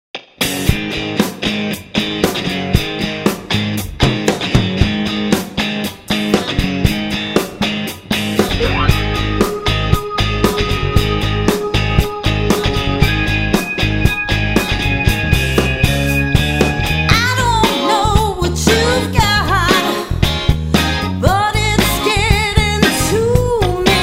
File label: hummin